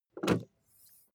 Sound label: car bmw e46 exterior bonnet open short